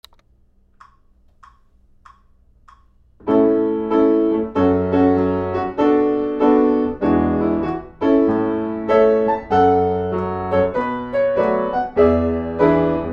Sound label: 96BPM